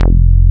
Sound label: JNO SPIK F2, SPIKE BS